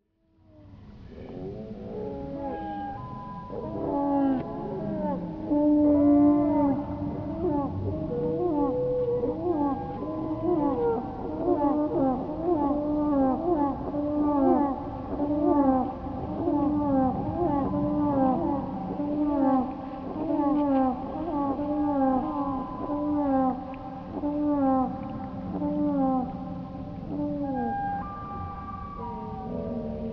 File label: seagulls repitched